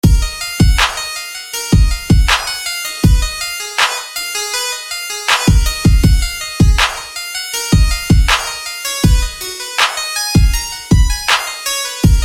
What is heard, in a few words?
Digital Harpsichord